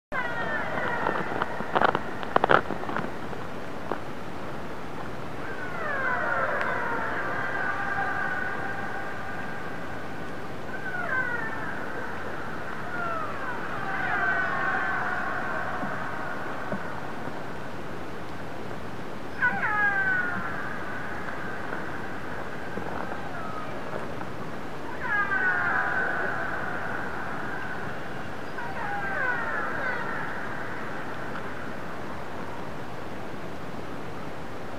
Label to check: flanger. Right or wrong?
Wrong